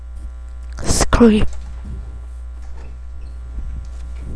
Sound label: scream